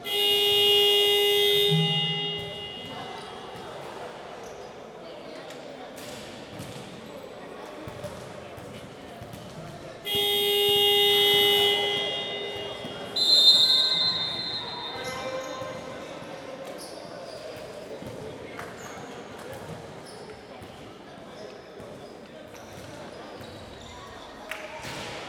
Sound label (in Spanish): campana, Carcaraña